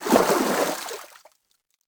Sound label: wade, Footsteps, Water; civ13; civ14; Civ Effects